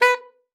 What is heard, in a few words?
B4, alto sax; Xsample VOL-2